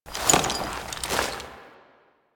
Horse Foley Dismount; Foley Actions; Warframe; Vehicles; Duviri

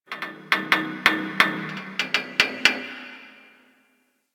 Ocean Medium Metal Creaks; Warframe; Grineer Ocean

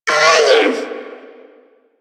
Repair Drone Idle Angry; Warframe; Necramech Repair Drone

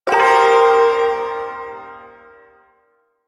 Shawzin Blues Chord; Warframe; Duviri Ersatz Shawzin

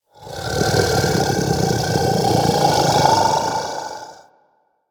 Vocal Snore

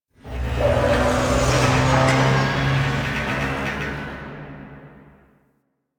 Ship Energy Rumble; Ambience; Warframe; Corpus Ship Remaster

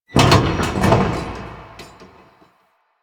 Large Vehicle Creak; Warframe; Ambience; Nineteen Ninety Nine

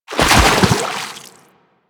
Roll Forward Foley Water D; Enemies; Warframe; Teshin

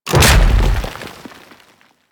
Thumper Bot Stomp C; Warframe; Grineer

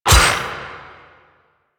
Melee Hit; Warframe; Voruna

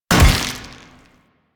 Pit Object Impact; Warframe; Infested

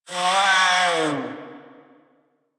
Feral Vocal Death; Warframe; Catbrow

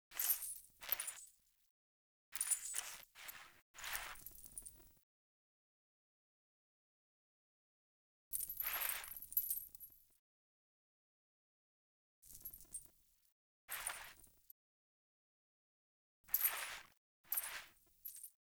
Foley; Friendly; Verminia; Bromius Quest

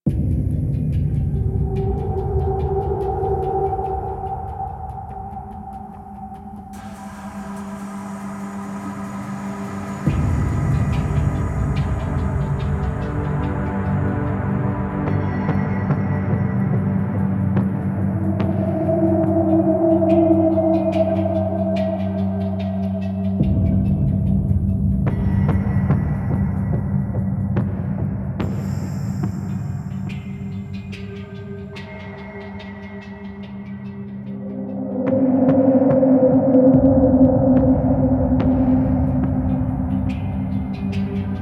Hub Vendor Loop; Warframe; DE; Hubs; Zariman

